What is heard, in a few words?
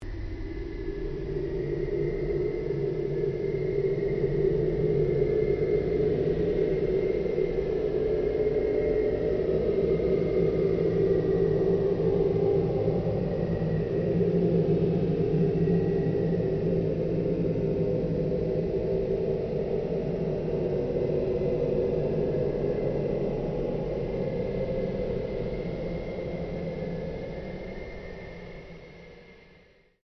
Ambient Drone No Sweep; Sound effects created by manipulating other sounds; Drones; Sound Design